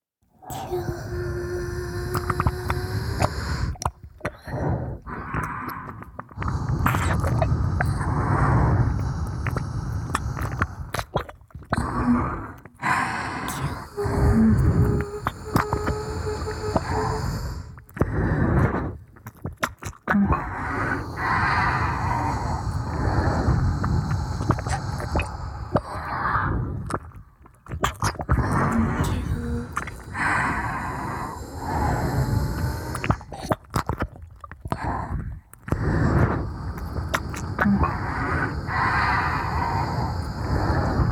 耳舐め; PC筋開発

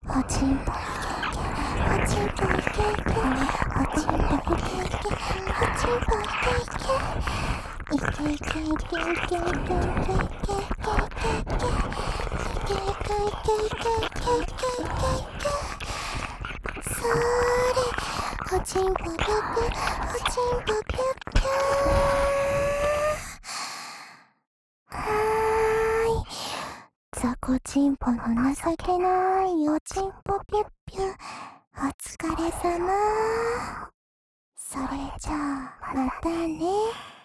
両耳れろれろ舐め; 射精ちゃえ♪; おまけループ（オナサポ）; パパのざぁこ♪ざぁこ♪

